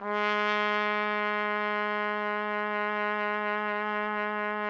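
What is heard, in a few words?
trumpet; Roland - LA Composer vol. 3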